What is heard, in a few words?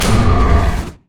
whirlwind; lissandra skin01